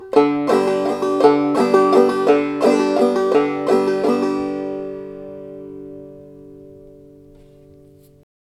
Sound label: waltz